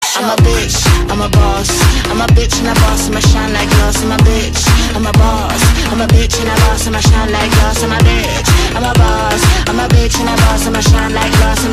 twinkle music

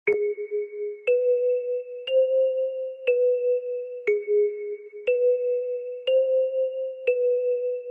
suspence song

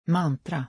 mantra

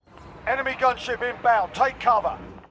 gunship enemy

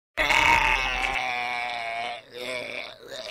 death scream; tobias fate

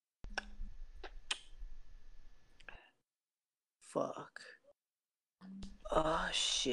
moan

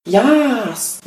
yaaahhhsss

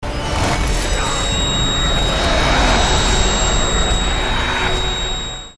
screech; kalameet

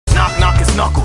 knock knock; its knuckles

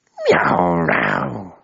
happe miau; memes

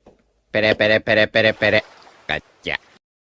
short ringtone; den den mushi

